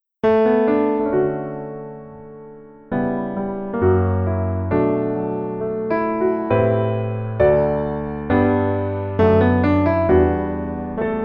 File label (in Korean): Piano ver, 멜로디MR